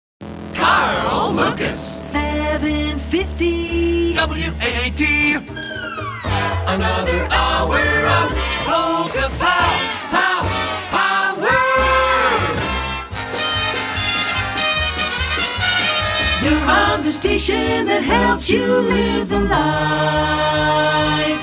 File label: Jingle; Short Attention Jingle Show IV